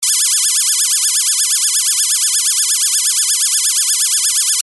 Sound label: alarm